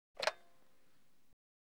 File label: Telephone, Push-Button Type, Hand; Household; BBC Sound Effects Library